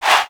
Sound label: Chant